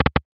reel stop; games; poker; American Poker The Legends II

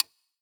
gambler mouseclick; games; Fenix Play 27 Deluxe; Wazdan

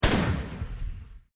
ShotMax; One Shot Fishing

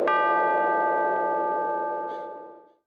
gambling round up; Wild Guns

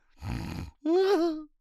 snoore; Racetrack Riches; megaboard

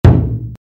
reelspin click; Journey To West

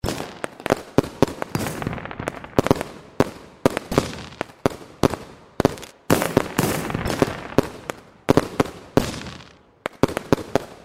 intro sfx; Bull Stampede

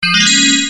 win, keno; games; Bingo

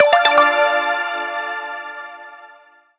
multi line win; Sizzling 777; wazdan

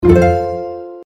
fairy scatter; games; Fairy Dust

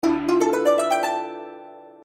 announcement; Four Beauties

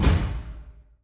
scatter announce; games; Aztec Gold Megaways

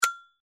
reelspin click; Four Beauties

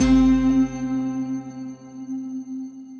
bom prize normal; Great Book Of Magic Deluxe; Wazdan

